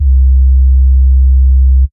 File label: C Bass